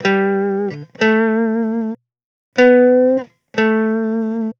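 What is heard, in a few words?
guitar, Roots Funk; USB Soundscan vol.41; AKAI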